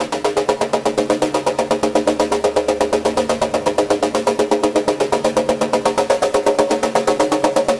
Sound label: 123 C Digital Ambience